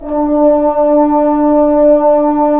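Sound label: brass trombones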